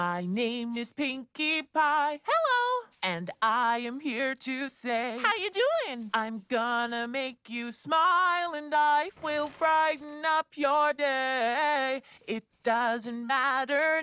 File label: Vocals only, Deeper Pitch